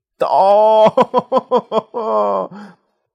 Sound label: nah ha ha ha